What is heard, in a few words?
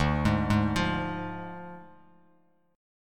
D Diminished D, Bass Standard; arpeggiated